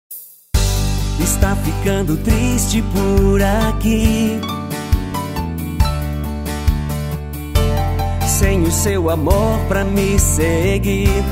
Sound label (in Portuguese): guitarra na janela